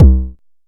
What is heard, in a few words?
analog tonal, Kickbank; mpk mini presets; Ableton Foundation FreePacks